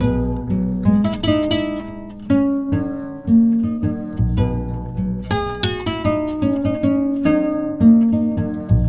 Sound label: KORA; Sonic Foundry (Sony Creative Software); Continental Drift Aftershocks; African